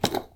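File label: open inst coff jar; cooking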